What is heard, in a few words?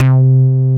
HOLLOW BASS; Masterbits; Dance Trax